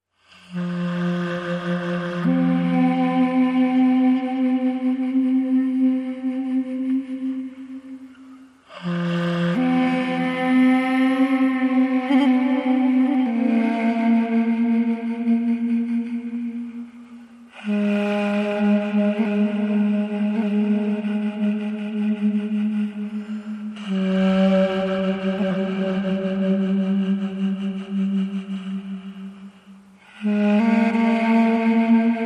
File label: Ghatam, Duduk Solo